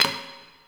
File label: Idle Heavy Hoof Stomp; Tagfer; Warframe; Ambience; Entrati